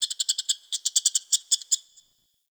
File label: Tree Critters; Ambience; Warframe; Eidolon Plains